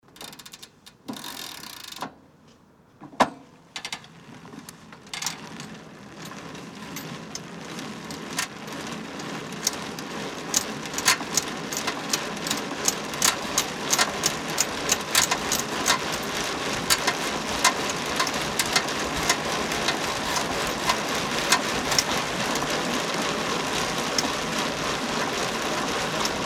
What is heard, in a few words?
bicycle run start stop